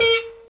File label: BUZZ XYLO A#5; Spectrasonics; Heart of Africa 1